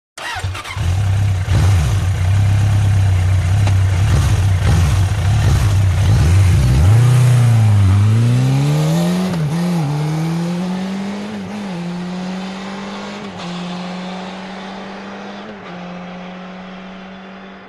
Motorcycle Road Racing

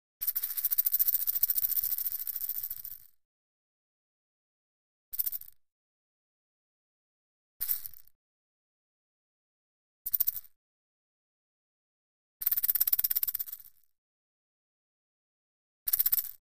Rattles; Various High-pitched Rattlesnake Shakes

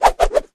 Air Swish Triple

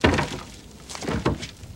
Dirt Throw More Dirt

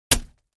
Axe Paneling 1 Side, axe hits

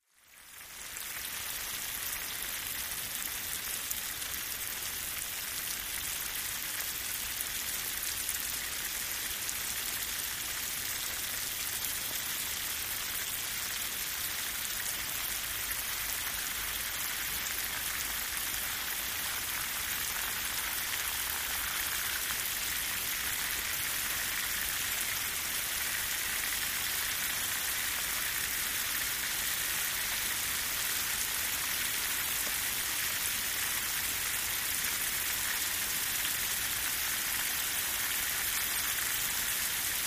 Rain Fall On Grass

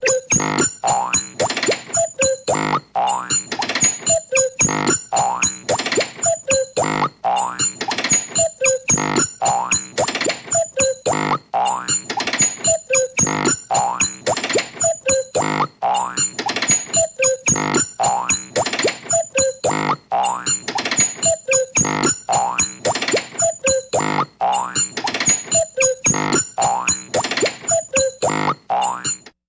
Comic Machine Fun, machines

